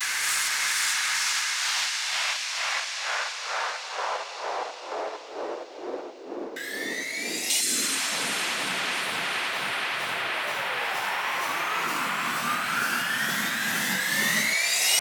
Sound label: FX, Drum Loop 128BPM; VEC5 Drumloops; VEC5 Multi Loops 128BPM; Loop Elements